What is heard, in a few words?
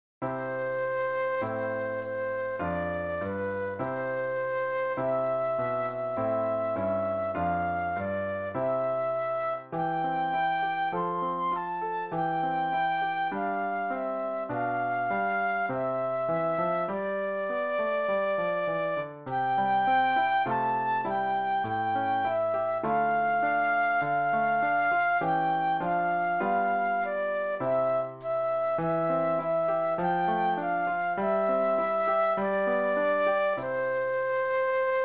Flute Solo; christian solos